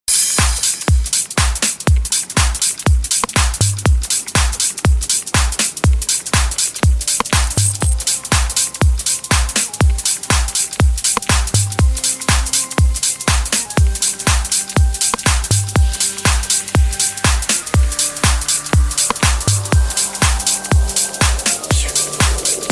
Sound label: mega funk, eletro funk